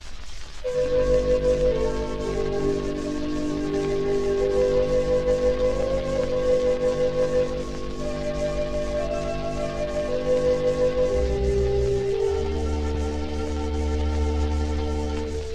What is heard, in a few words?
organ solo, Safe in the Arms of Jesus